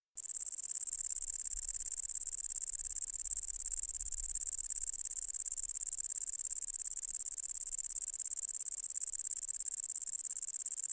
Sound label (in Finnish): lehtohepokatti iltapaiva